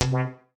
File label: rubber wall